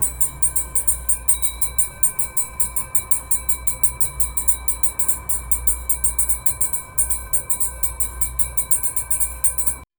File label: soft school bell